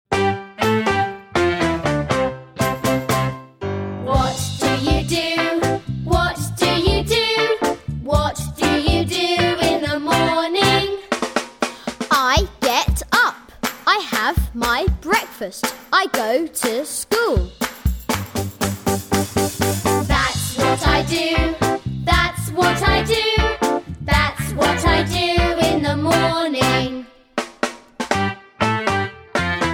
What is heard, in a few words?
karaoke